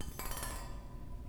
Rhythmic Metal; BB Retail Therapy Sample Pack

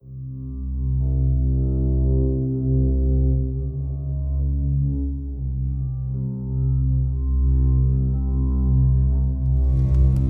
Deep Pad; BB 2HTC Samples Vol 4; Pads

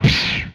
Gun, retro arcade; Guns

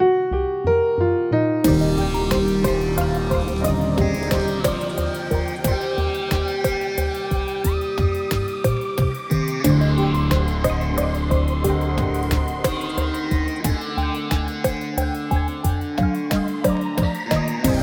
Guitarish; Solos

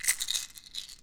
Prescription Shake, pill bottles

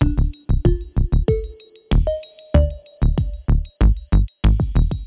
Creepin' Bass, Loops

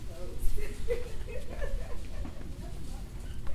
Laughing; BB Retail Therapy Sample Pack

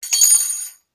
metal falling; wood metal SFX

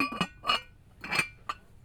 Ceramic Bowl; BB Retail Therapy Sample Pack

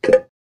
pot

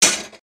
tools, wood metal; wood metal SFX

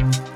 Slap Bass; Drums; BB 2HTC Samples Vol 4

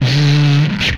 Hydraulic; retro arcade

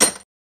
metal falling

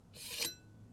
Small Knife - Scrape, kitchen knives; Micro Pack

